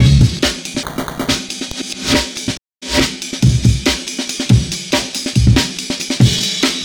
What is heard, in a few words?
Amen Breaker 140Bpm; vst fx bundle